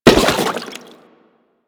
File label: Step Water, Footsteps; Warframe; Corpus; Crewman